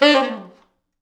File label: T Sax falls, Horns Sax